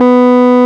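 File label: RMI Piano B 2, RMI EP; Sonic Reality; Vintage Timetraveler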